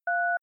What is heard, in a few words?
DTMF 1